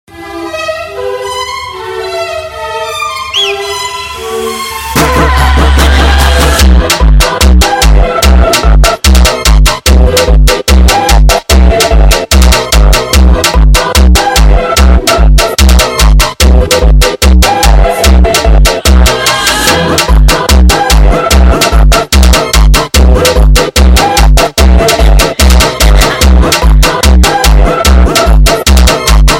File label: Funk sped Up